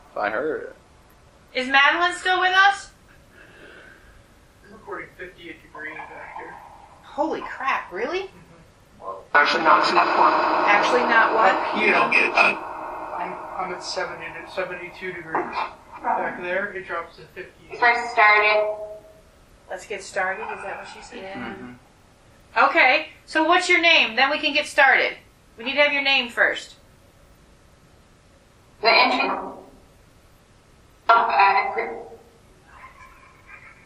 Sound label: Living Room Phasma Box; private residence freedom road apartment investigation return